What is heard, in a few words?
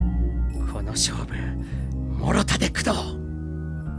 gong teng; morotade